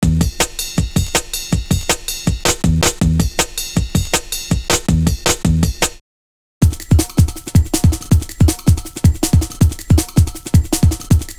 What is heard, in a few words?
breaks, Jungle; Zero-G Jungle Frenzy 1